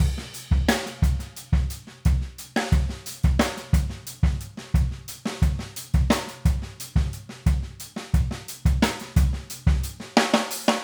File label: alt beat; maudio; World Rock